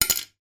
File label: household nail brush small plastic drop into bathroom basin; zapsplat